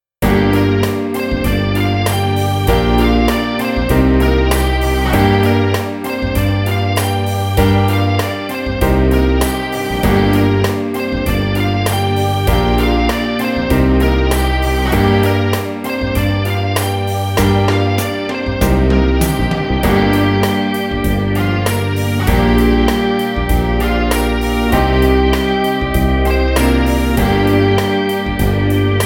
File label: Penta in Gm 98 bpm